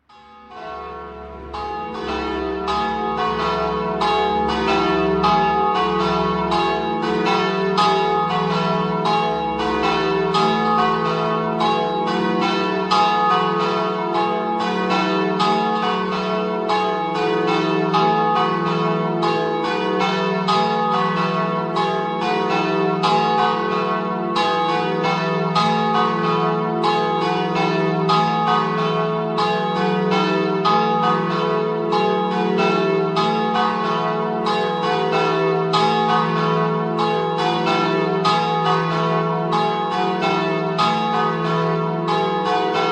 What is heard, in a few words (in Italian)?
campane; CLAUIANO